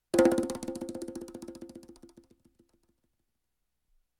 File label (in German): conga wirbel; Suedsee